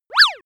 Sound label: slip